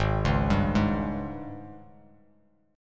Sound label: G sharp Major 7th Suspended 4th, Bass Standard; arpeggiated; 4,4,5,1